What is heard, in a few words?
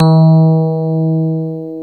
KEY EP3 D 2, Digital EP's